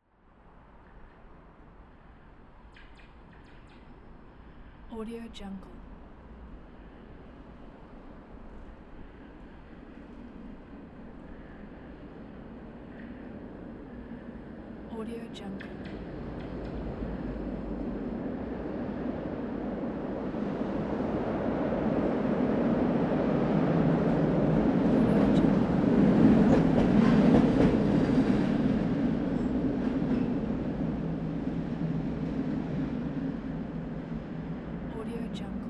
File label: tram passing by through a bridge; urban sounds